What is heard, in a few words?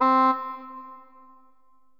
english horn